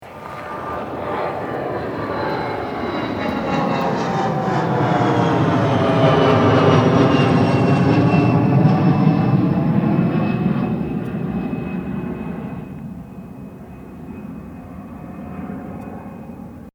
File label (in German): Flugzeug